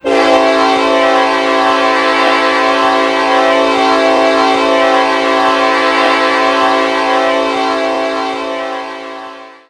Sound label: k5lla, horn; bnsf; ns pittsburgh line east horn pack; run 8